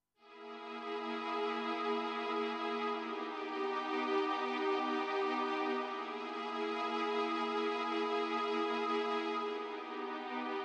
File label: Suspense Str